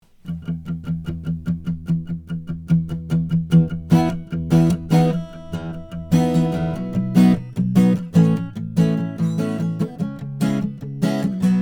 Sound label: mcpherson carbon; kevin michael tourin